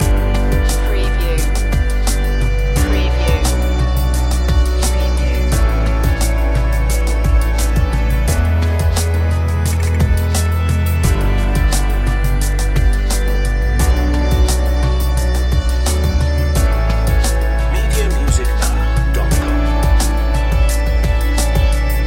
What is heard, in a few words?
growing radiance loop